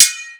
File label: sword